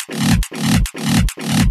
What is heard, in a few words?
Fill 140 BPM